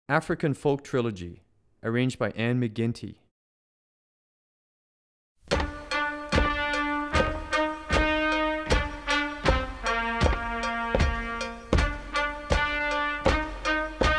African Folk

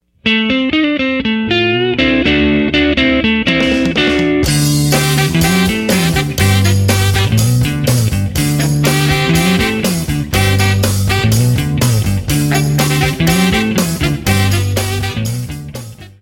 rock method

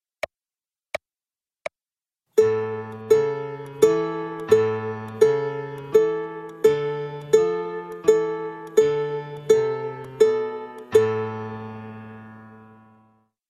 uke kids

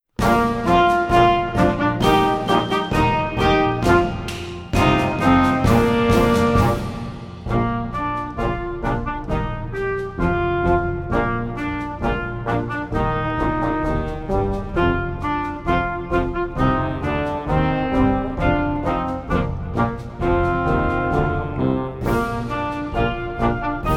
March

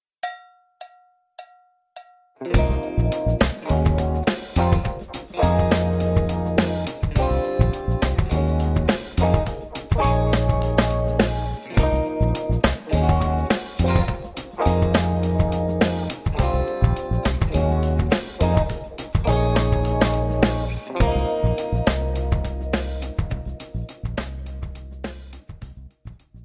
jazz rock

